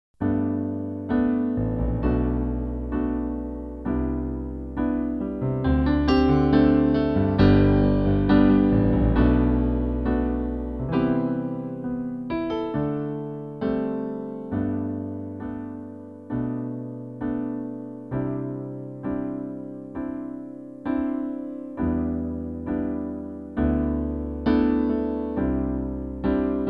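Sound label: G live trak, female backing tracks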